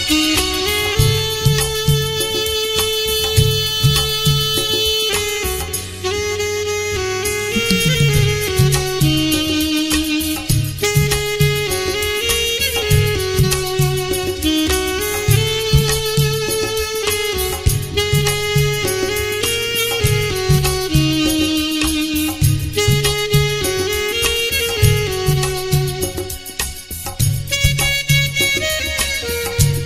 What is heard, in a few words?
Bollywood Instrumental Song Ringtone